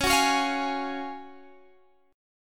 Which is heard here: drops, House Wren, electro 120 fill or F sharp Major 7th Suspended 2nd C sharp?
F sharp Major 7th Suspended 2nd C sharp